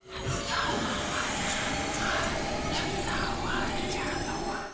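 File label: mystery Symbol Anim Start Riser; netent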